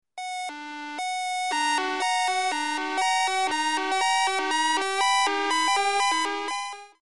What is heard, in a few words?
Super Duty Alarm